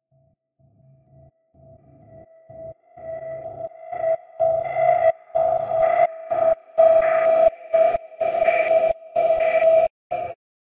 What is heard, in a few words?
RVRS, Cinematic Metal Impacts; boom library